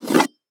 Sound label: Quickstop; netent